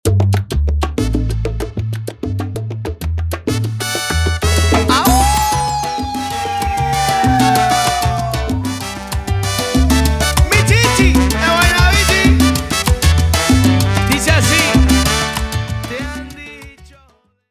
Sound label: Intro Outro 96 BPM